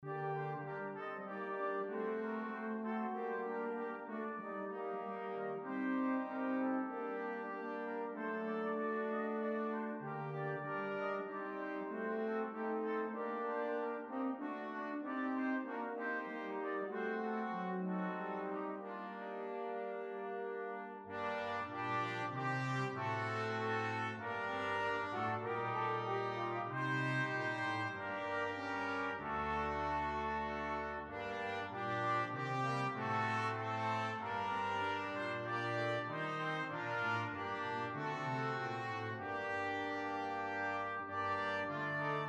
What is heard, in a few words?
Brass Quintet; Liedboek